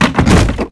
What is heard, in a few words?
Oyster Shut; Finding Nemo; XBOX